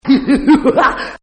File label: Weirdo Laugh; Laughter; Voice; Partners In Rhyme